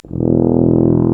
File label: TUBA C; Solo Brass